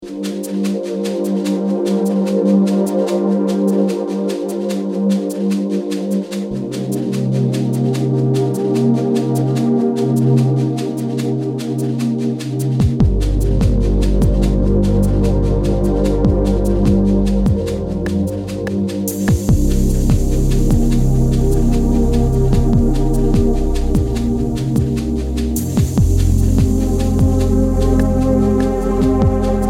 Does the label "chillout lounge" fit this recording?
Yes